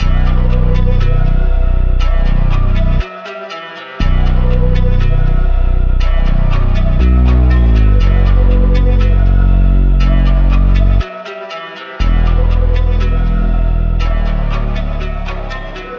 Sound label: Violin Loop Bmin 120BPM